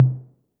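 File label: Roland808 mid tom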